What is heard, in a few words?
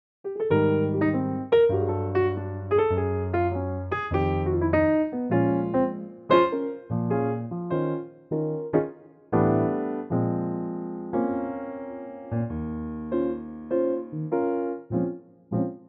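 Ab piano, male; key - Ab - vocal range - Eb to Ab; jazz standard, in a super piano only arrangement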